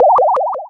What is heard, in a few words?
Cry